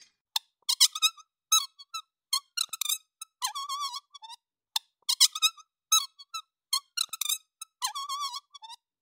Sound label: Marker on Whiteboard